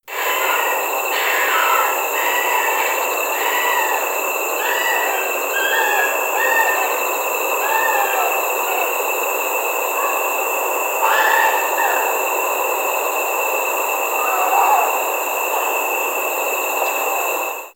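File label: Ezo red fox, cub; sapporo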